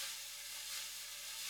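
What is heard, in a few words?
fast brush; Northstar - New Gold VOL-2; 30S BRUSH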